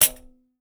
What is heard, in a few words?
14 PANDE, PANDEIRO